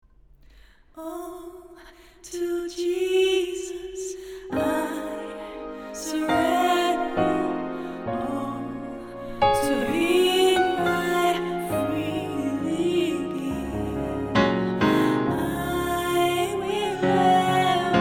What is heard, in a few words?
Sop, choir; Presence op het Malieveld